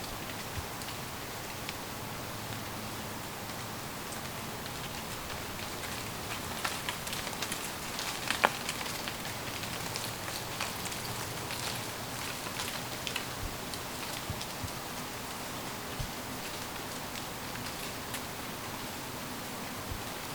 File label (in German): Wald Sound Wald im Spätherbst Blätter fallen vom Ahorn; Kamelberg